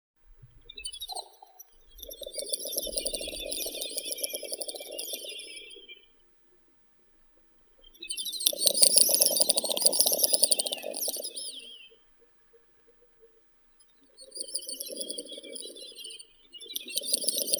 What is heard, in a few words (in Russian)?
dupel gallinago media